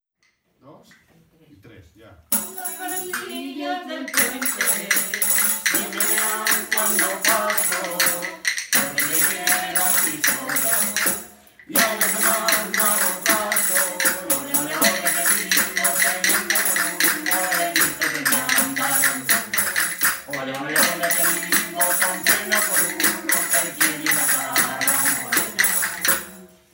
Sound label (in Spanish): Tonada de Fresno el Viejo